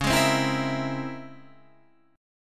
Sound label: C sharp Minor Major 13th, guitar chord